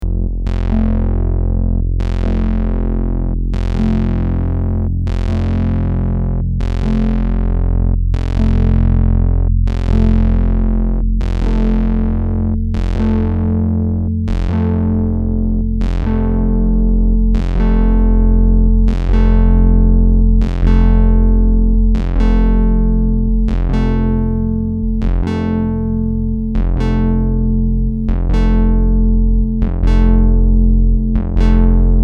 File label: moog modular